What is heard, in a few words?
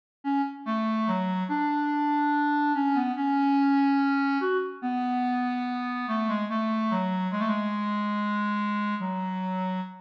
fugue